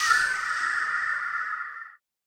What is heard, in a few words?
scifi distant hit; soundscrate